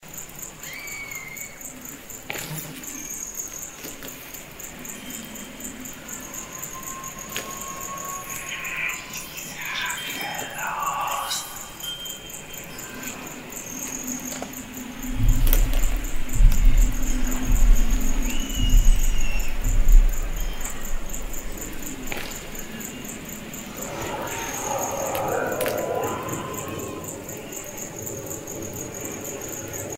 Haunted Forest; Justin Danger

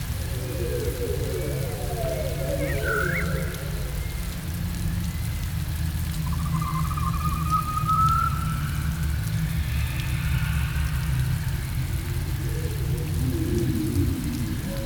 Spooky Forest Theme w. Ambient

